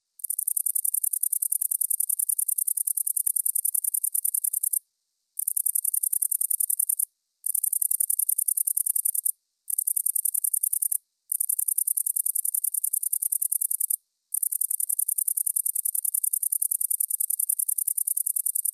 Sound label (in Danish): stor grøn løvgræshoppe